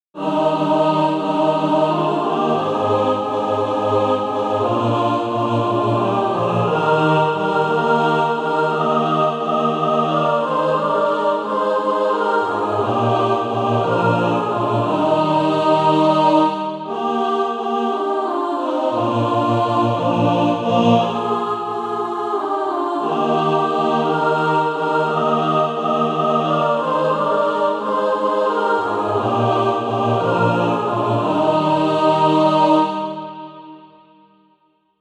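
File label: mixed, SATB Practise Music; Draw Me to Thee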